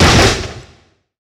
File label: wood crash; svencoop